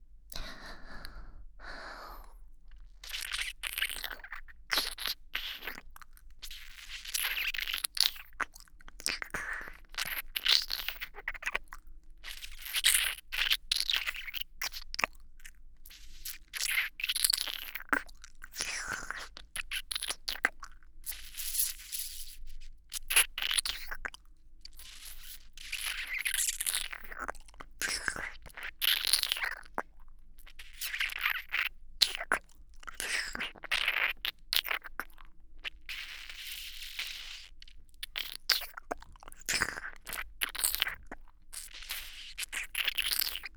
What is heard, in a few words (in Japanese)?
脳吸いコース